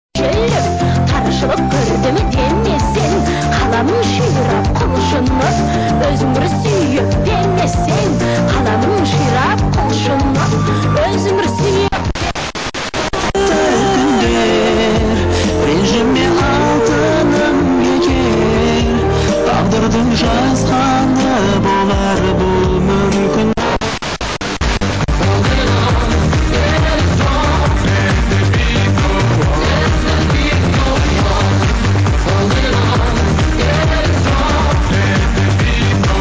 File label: 85 110 MHz